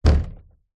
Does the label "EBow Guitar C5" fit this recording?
No